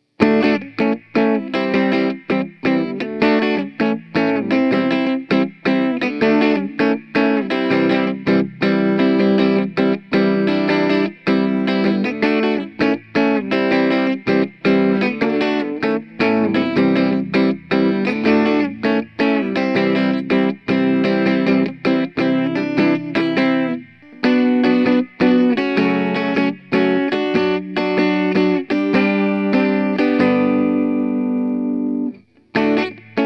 Reverb Both Pickups, guitars; Vox Mods

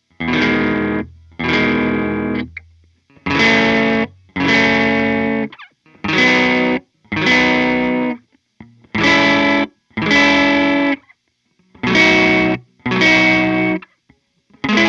E Seventh Harmonized, guitars; More Otto Mods

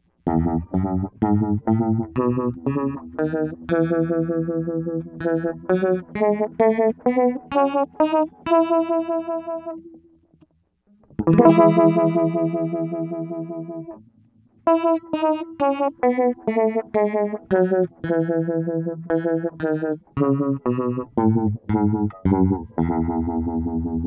Roger Mayer Chorus E Major, guitars; Pedal Effects

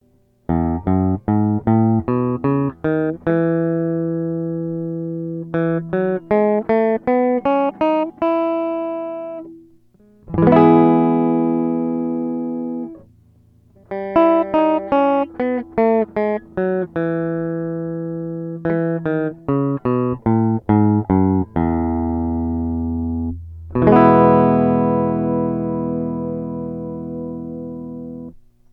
Weber 5G15 Reverb Bypass E Major, guitars; Pedal Effects

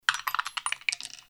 12mm dice; Campanile Dice Tower

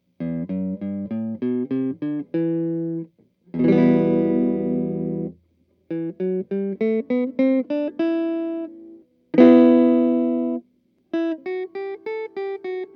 E Minor Bridge, guitars; Vox AC4 Mods

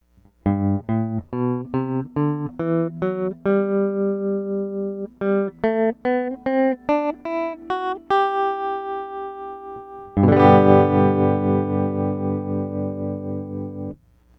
Fulltone Supatrem G Major, guitars; Pedal Effects

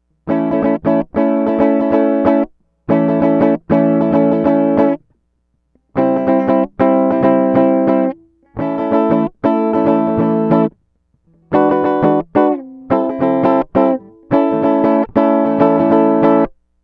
Reverb Bypass, guitars; Pedal Effects; BYOC

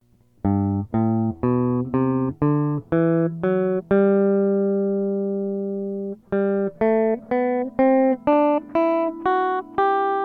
Weber 5G15 Reverb Bypass G Major, guitars; Pedal Effects

